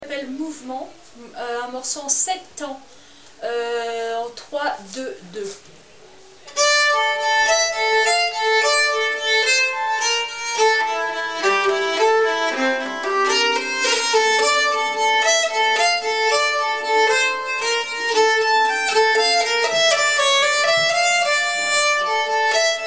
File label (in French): mouvement, nyckelharpa